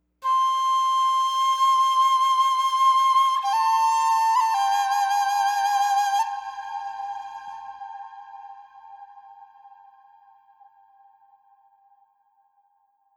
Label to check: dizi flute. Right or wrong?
Right